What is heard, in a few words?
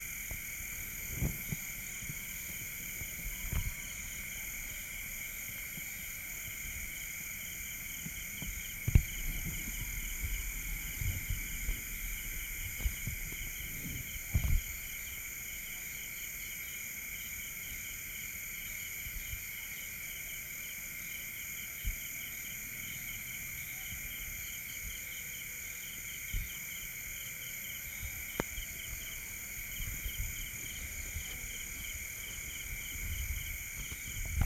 night frogs, South India street recordings